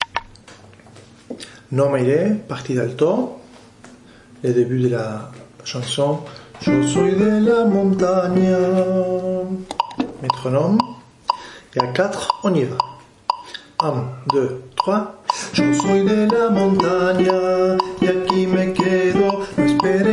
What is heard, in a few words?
Alto